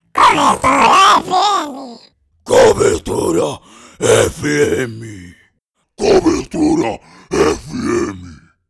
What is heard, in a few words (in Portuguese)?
vinheta